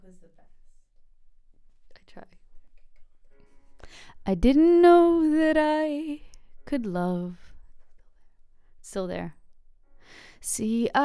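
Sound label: vocals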